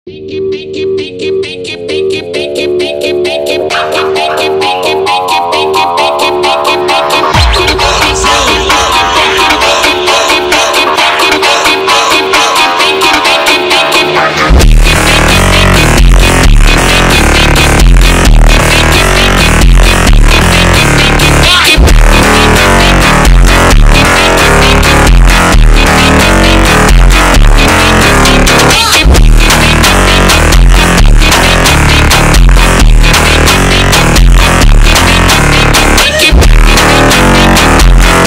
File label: Phonk Brazilian